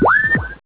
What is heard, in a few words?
bweeep